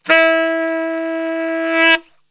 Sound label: E 2 SAX, Brass Super Section; Best Service